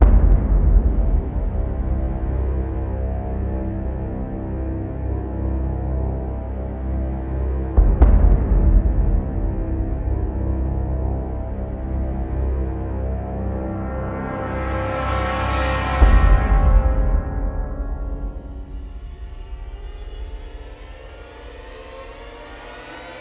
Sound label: Scared Epic 120BPM, Cinematic Trailer Music; BlueFX